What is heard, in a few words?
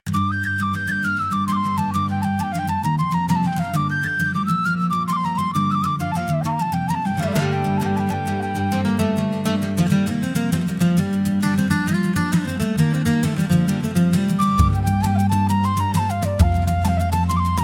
forest whistle a celtic whistle tune soundtrack; folk-celtic